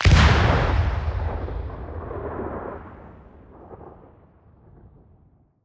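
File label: Reaper Nuke Destruct Explosion Mid Distance; Titanfall 2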